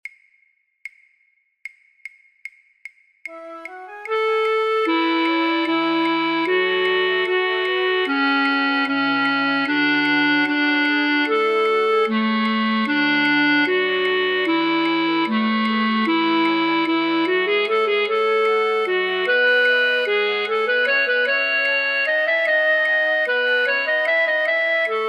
Ritournelle flute choir altfluit; voor-oefentracks; Rameau; Platee

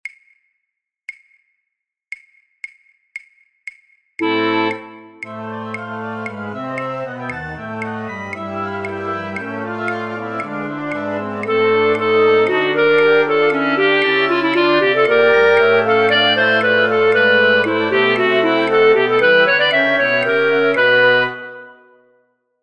fluitorkest, Fluit 2A1, Fluit 2A2; voor oefentracks; Matthaus Passion

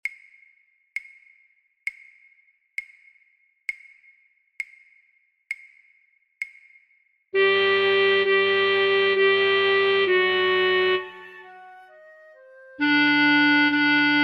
alt Stabat Mater, altfluit

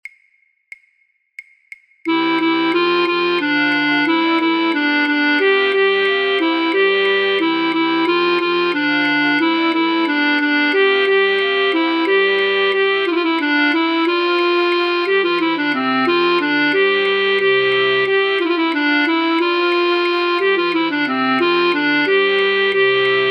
Branle flute choir voor oefentrack fluit; Rameau; Platee

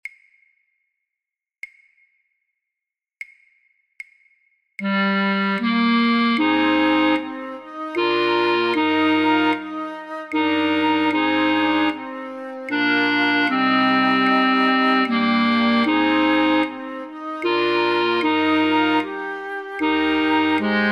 Holst Jupiter Andante oefentrack altfluit; Andante maestoso

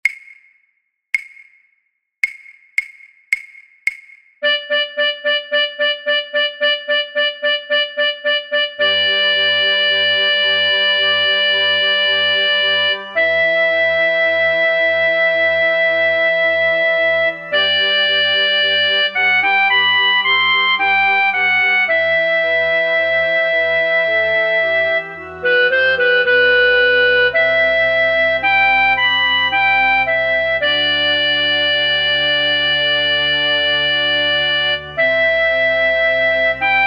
fluit

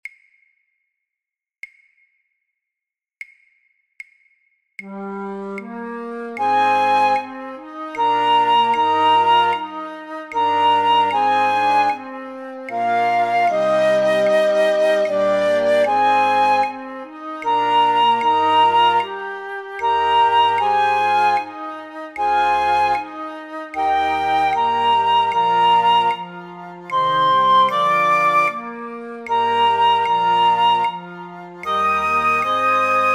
Holst Jupiter Andante oefentrack piccolo; Andante maestoso

